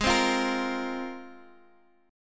G sharp 6th Add 9th, Guitar, DADGAD; x,x,6,5,8,8; down; chord shape

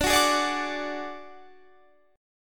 D Major Flat 5th D Guitar Standard, chord; strummed; JGuitar

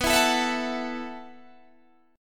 B 7th Suspended 4th B down Guitar DADGAD

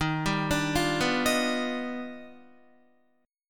Eb Augmented Major 9th Eb down Guitar; Eb+M9 arpeggiated; JGuitar

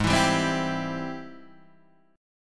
Ab Augmented Major 7th Ab Guitar DADGAD

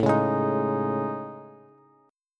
A Major Sharp 11th, Bass, Bass Standard; AM#11; strummed; JGuitar

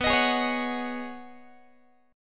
B 6th Add 9th B down Guitar; B6add9 strummed; 4A,4E,5A,5D,5G#,6C#; chord shape; JGuitar

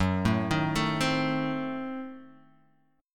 Gb-Diminished 7th-Gb-down-Guitar-Open F; arpeggiated; JGuitar

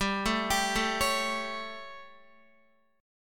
G Suspended 2nd Flat 5th chord, Guitar; Gsus2b5 arpeggiated; JGuitar

